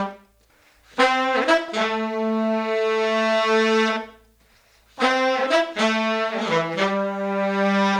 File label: Horns, 70s Analog Funk; Sonic Foundry (Sony Creative Software); What it Is!; Classic Caddy